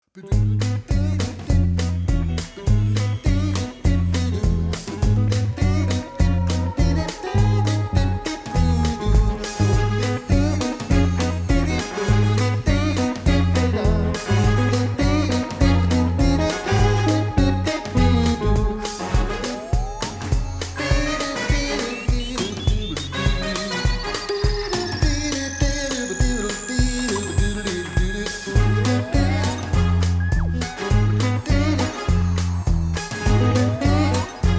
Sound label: fast swing; Big Band Scat